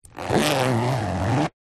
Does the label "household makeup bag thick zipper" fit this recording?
Yes